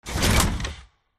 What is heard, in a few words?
movement foley; gow; silverback